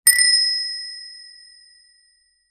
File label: sound donation coin, game ui